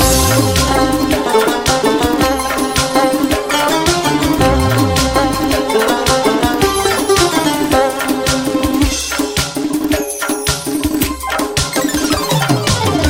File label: Accordion